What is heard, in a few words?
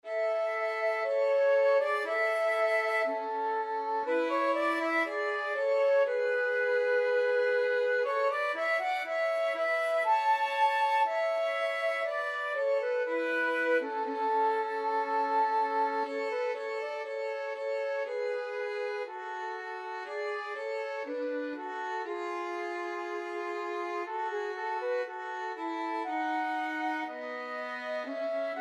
flute violin duet